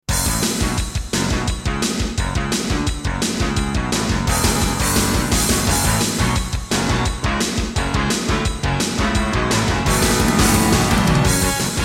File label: Intro, Roland RAP-10; Duke Nukem 3D